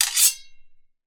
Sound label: swords x 2 hit scrape